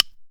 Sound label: WOODSTICK; Best Service; World Colours